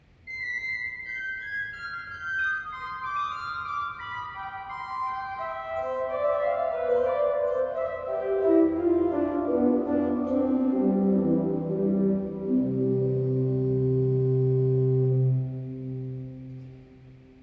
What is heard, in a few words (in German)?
prinzipal 4, Prinzipal 4'; kirchenmusik; pfeifen; gereon koeln